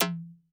Perc Reso, Vermona Mono15 Drums

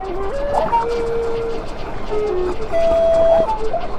Weird Flute; Sonic Foundry (Sony Creative Software); Ma Ja Le Ethereal Textures; Flutes

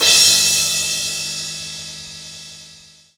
XV Crash, Roland XV-5080

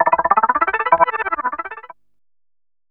DR. LOOP, TRANCE; X-Static Goldmine 3

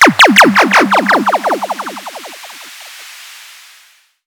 fx dub bleeps 165bpm; M8; M8JUNGLEPAK1

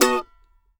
MANDO 7TH B7; Sampleheads; Whole Lotta Country 1 & 2

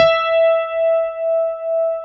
CP70; USB Soundscan vol.09; AKAI; Keyboards Old School

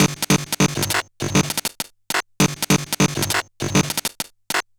Bent RX17 (100BPM), Circuit Bent

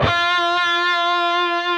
PRS F#4, PINCH, Guitars; Spectrasonics; Hans Zimmer Guitars VOL-1

